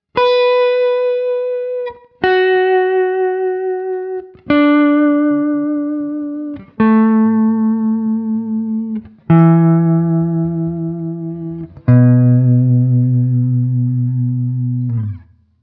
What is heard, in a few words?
Vibrato